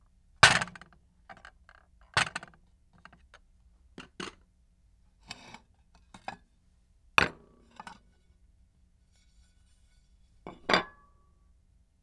Kitchen Plates

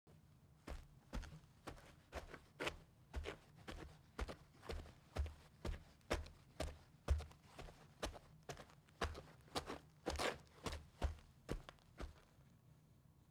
sand

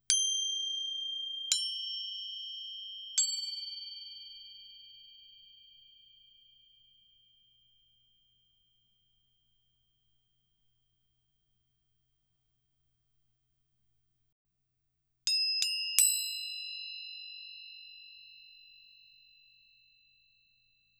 Turkish Cymbals

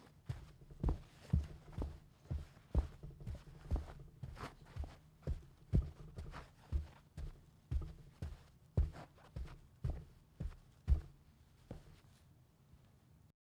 hard carpet

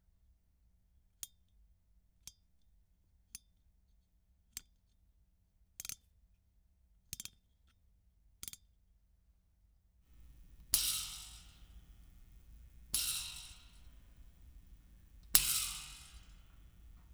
Desk Lamp

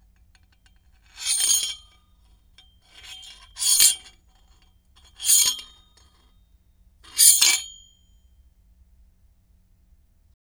Metal Pipe

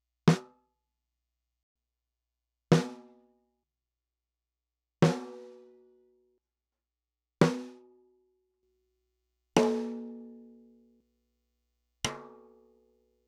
Snare SM57

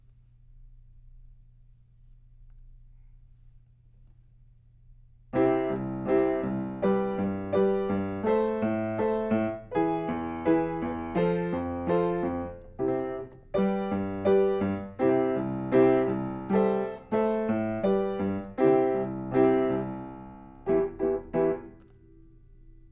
Piano Top

